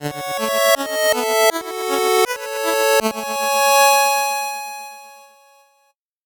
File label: 8bit jingle slow